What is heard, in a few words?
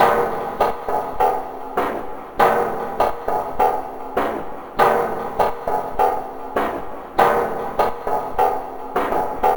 Bow Warped Metal; Electronica